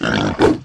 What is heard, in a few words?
Pig attack; Eft; Dung Pig